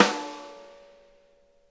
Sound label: RIM, snare; Sonic Reality; Interactive Drum Kits